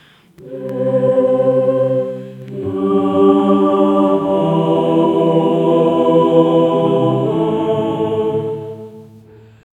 a chorus of angels singin

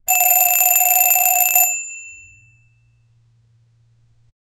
a large vintage grand doo; house

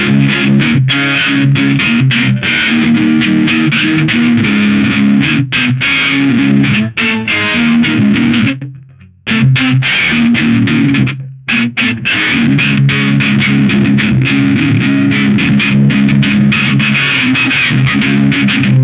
long creepy low distorted, guitar

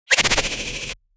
shield block sound 16 bit

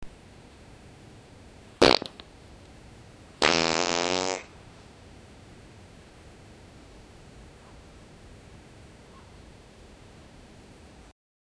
short loud fart in a silent room; awkward

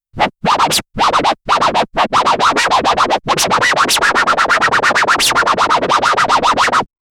dj disk scratch sound; sound effect

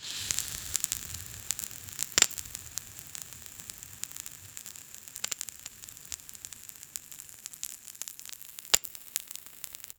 fire crackling oil sizz

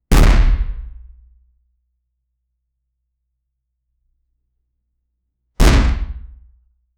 evidence slam heavy paper; reverberating

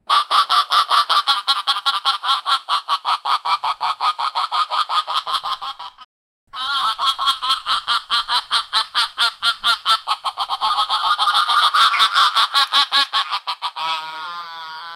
the white fronted gooses, calling

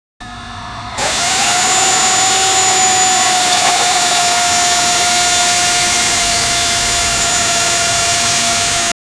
welding sound; construction

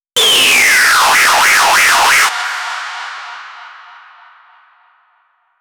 jumpscare animatronic

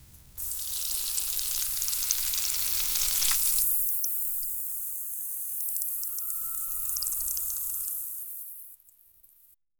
seed spill

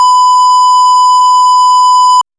electric, retrowave

